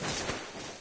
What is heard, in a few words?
foot slide, zombie; Source Engine; video game; npc